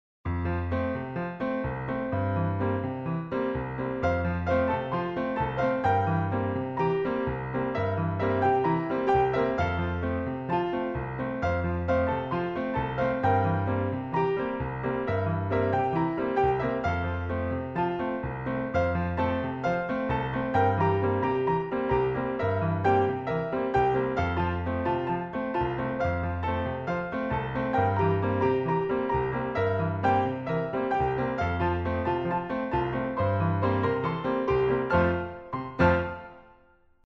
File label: Samba